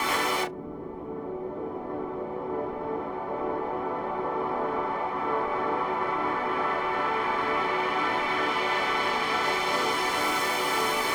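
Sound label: synth, Breakdance 172 B minor; Big Fish Audio; Hardcore Breakbeat Action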